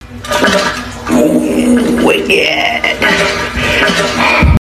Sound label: big fart toilet